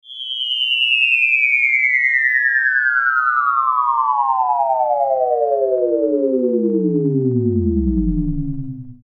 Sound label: Flying saucer down